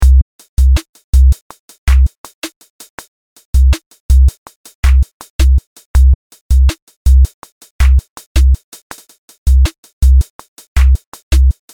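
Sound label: god blast 81BPM, Loops und Beats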